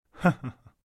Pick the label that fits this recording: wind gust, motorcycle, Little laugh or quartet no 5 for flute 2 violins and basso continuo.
Little laugh